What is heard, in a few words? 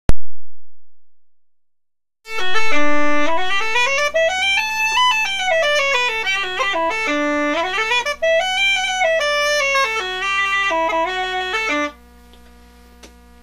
childress chanter alone, uilleann; scott estes